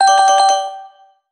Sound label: Correct